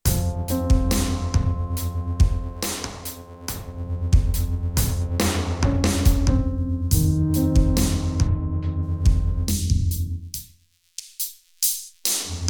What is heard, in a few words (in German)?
Endorphines Golden Master als Master Effekt